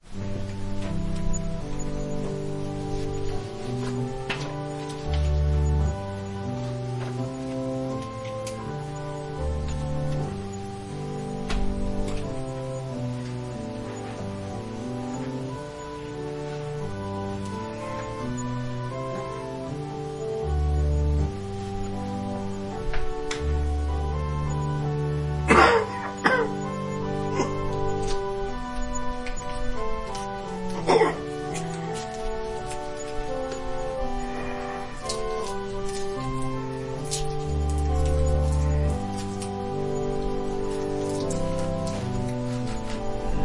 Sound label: Organ prelude; A broken and contrite heart